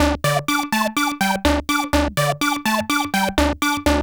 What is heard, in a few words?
Syrinx, L; AMG - Vince Clarke Lucky Bastard